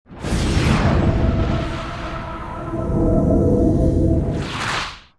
wormhole; bajoran

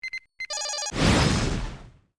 probe launch

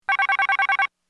alert, computer

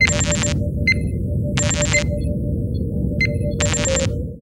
ambient bridge, computer

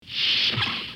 swoosh; TOS

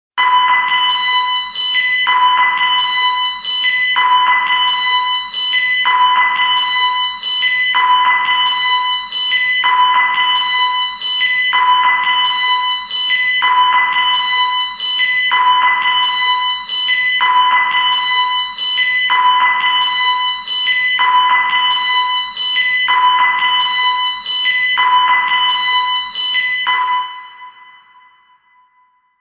sickbay drug; venus drug; TOS

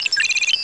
input ok, computer

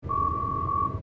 incoming hail, computer

